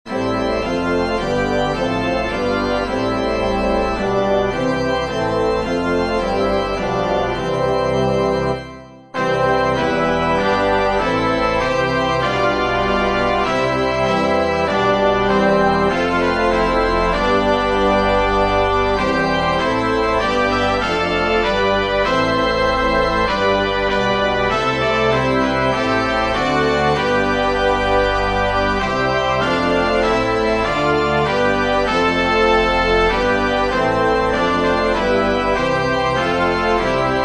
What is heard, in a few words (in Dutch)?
brasskwintet; Liedboek 2013